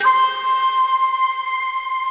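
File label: up shakuhachi; SoundFactory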